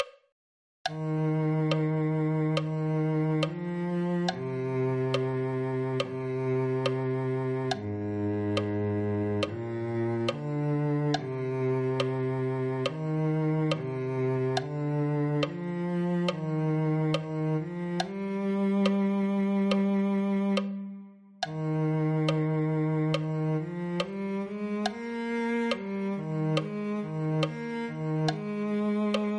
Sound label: cello